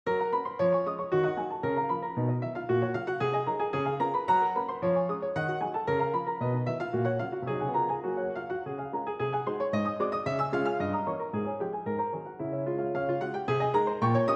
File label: Etude in Gb major; Etude Op. 25 No. 9; Butterfly